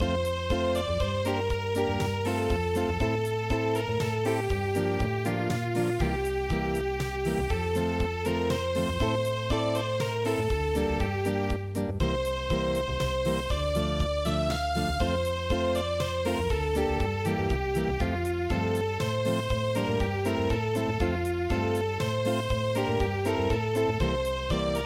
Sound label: Unplugged, midi